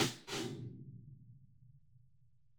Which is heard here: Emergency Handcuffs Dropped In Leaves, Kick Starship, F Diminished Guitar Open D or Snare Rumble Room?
Snare Rumble Room